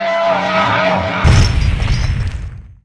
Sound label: death; kenta